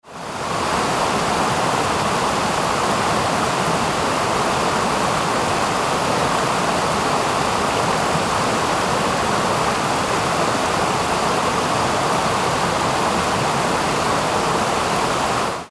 waterfall

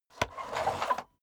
vacuum sweep back

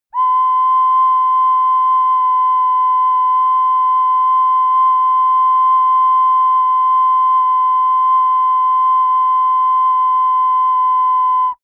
ocarina unstable low long

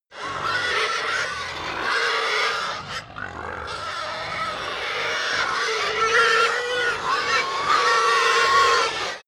pig herd grunt scream many, pig

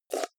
jar plastic midsize close fast

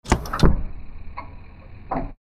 machine scream

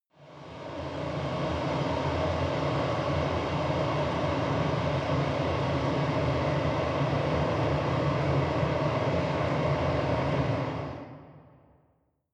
factory hall

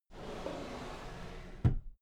drawer cabinet equipment close soft

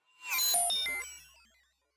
fly by drone digital

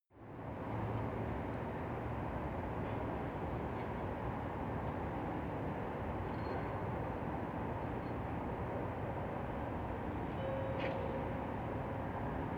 suburb nightfall construction site

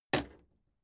impact sweetener wood soft

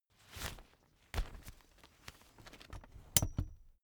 umbrella big open full soft

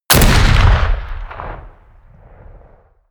shot

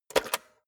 cassette player slide tray take out medium

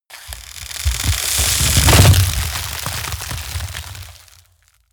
ice medium crash

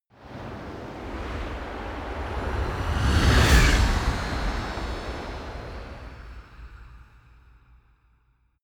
whoosh magic gust

